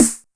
snare 909 EQ, EQ snare; Masterbits - TR-Minator

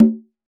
Conga Lo Open; Roland BK-5; Percussion

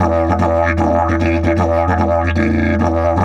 150, DIDGE RIFFS; Big Fish Audio - Didgeridoo and Other Primitive Instruments

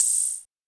open hat; Polyend; Legowelt

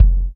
MUFFER; Ensoniq ZR-76

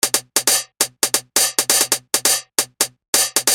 Hats 135bpm, Perc Loops; Zero-G Sound Sense Grime Scene; Misc & Perc Loops

